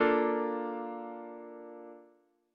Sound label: bb maj7; ackordtabell